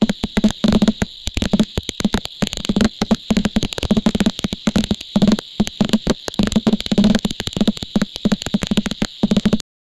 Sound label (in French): gouttes de pluie sur une; mushrooms